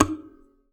Classical Guitar Extended Technique

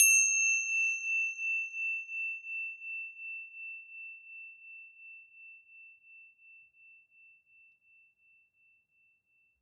Tibetan Cymbals; Percussion Samples

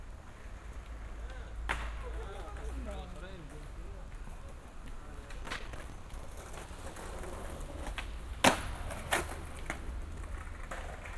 Skateboarders, Belfast; Sound Scapes

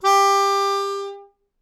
Melodica Long, Long Notes Chromatic

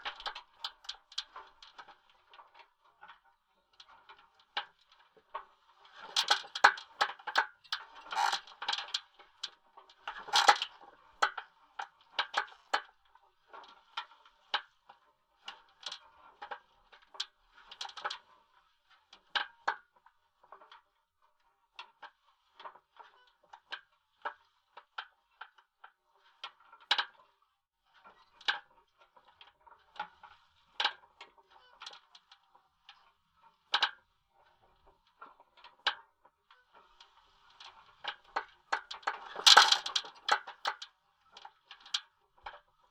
Sailing

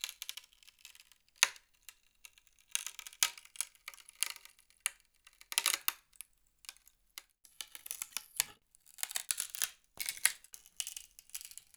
Palette Tear, Wooden Palette

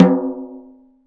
Snare Drum; Percussion Samples

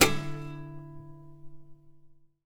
Classical Guitar Extended Technique Plink

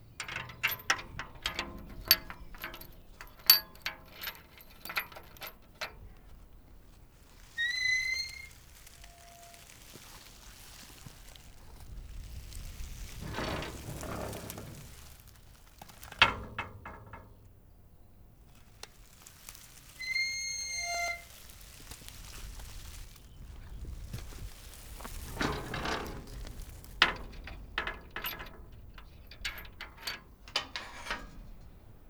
Gate

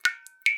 Drips

